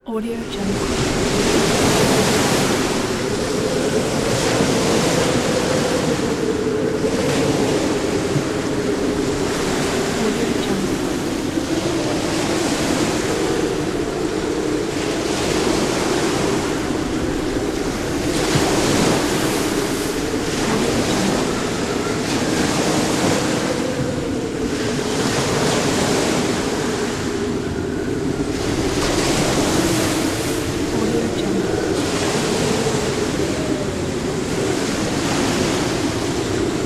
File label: storm; fx-library